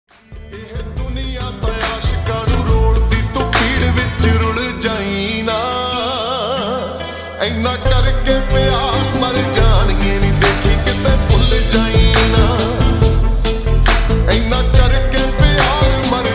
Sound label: Slowed Reverb Ringtone